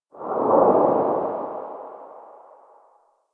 Operator Wraith Void Move

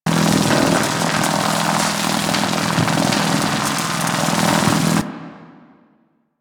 Galleon Electricity loop; Ambience; Warframe; Grineer Galleon

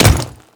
Default Step, Footsteps; Royal Guard

